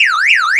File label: car alarm loop, vehicles; Call of Duty Modern Warfare